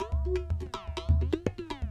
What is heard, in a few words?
PERCLOOP ETHNO 125; eJay